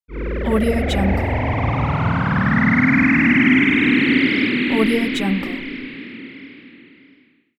wave wobble fast

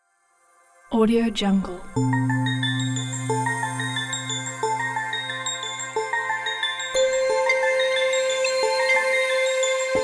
soft electronic logos pack

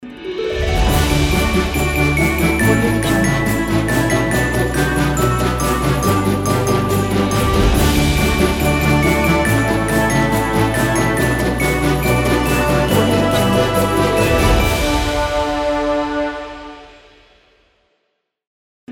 christmas ident

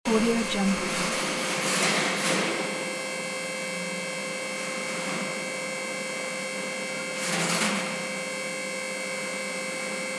equipment room

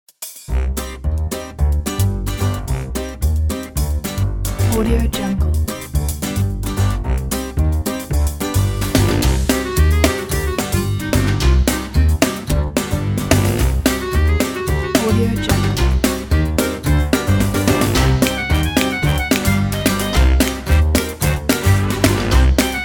swing; swing pack

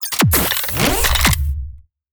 future weapons submachine gun reload; future weapons 3; soundmorph

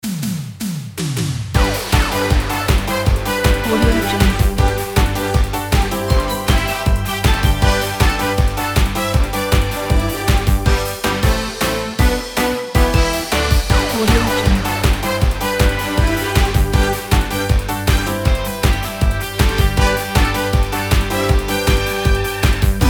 tv game show opener

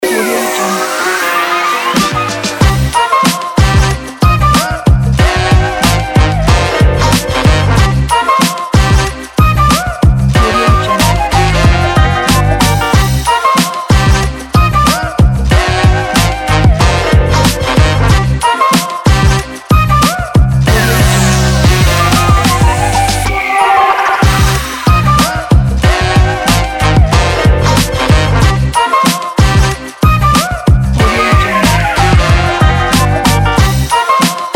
hip hop

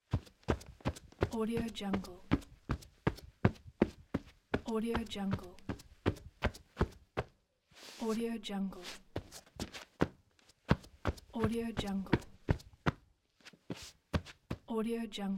foot steps shoes

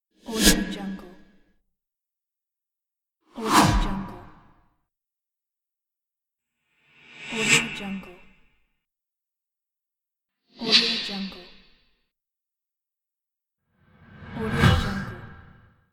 transitional whooshes

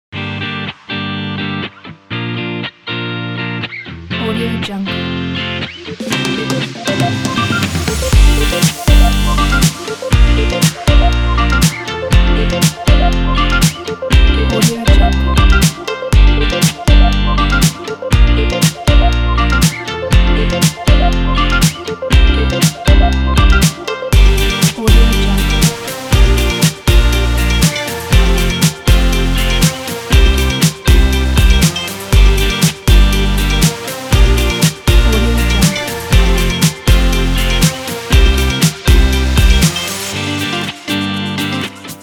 upbeat guitar pop

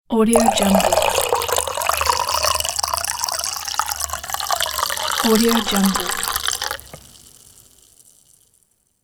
pouring water into glass

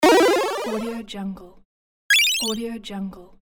8bit power up

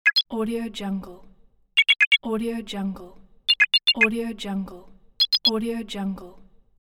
message notifications

